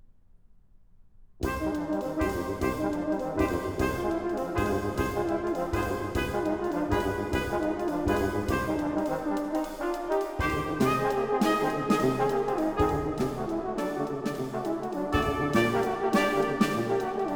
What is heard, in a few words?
Prelude and Fugue, for keyboard in C minor; WTC I/2; BWV 847 (BC L81); Bach for Babies; Fun and Games for Budding Brains